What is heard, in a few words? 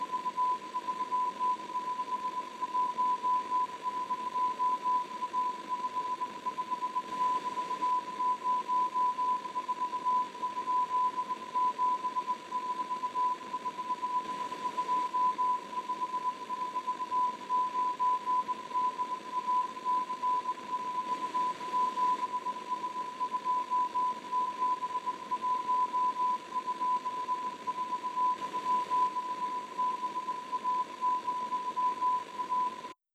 isolated morse; information-center